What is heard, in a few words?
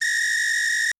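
Whistle; Yamaha DTXpress-II; Perc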